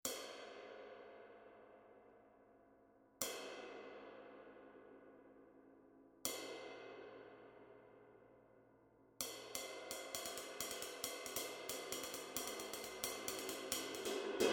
20" Avanos Ultra Dry Ride, Bosphorus; cymbal; 2010g

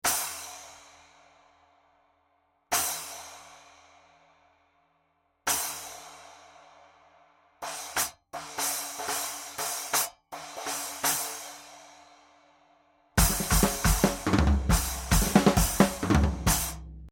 12" Black Pearl Splash, Bosphorus; cymbal; 388g

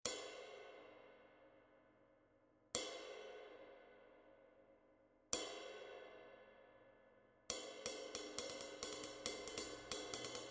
20" Turk Ultra Dry Ride, Bosphorus; cymbal; 2030g

